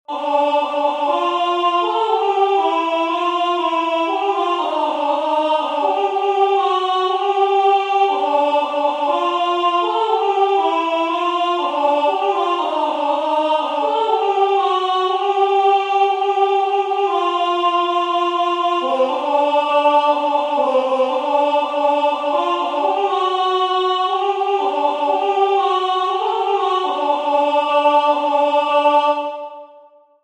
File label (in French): Chanson a boire, Alto; Bataille Gabriel